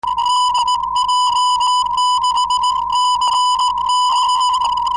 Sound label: 472 kHz; 472khz web swl; dj6cb